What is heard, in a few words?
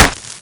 Fist wall; weapons; garrysmod